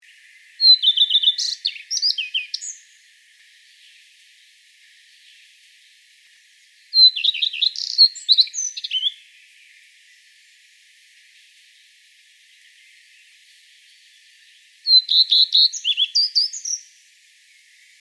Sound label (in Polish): pleszka śpiew samca